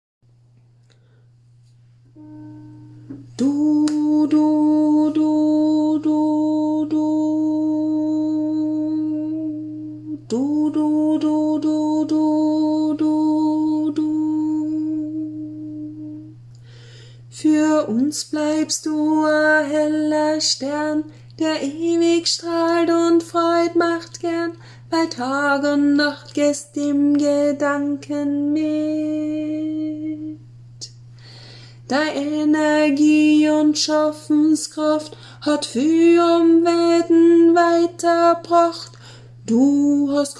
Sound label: alto verse